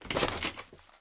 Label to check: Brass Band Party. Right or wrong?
Wrong